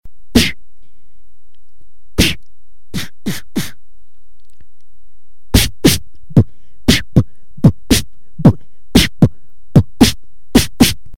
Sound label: snare